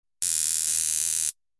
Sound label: Taser buzzing close perspective